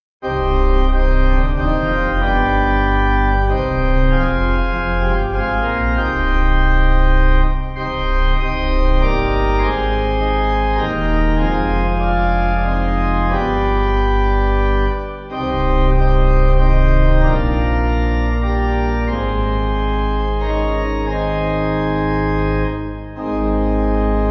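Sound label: Pipe, Pipe Organ backing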